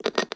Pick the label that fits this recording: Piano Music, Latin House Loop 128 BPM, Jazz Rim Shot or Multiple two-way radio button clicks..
Multiple two-way radio button clicks.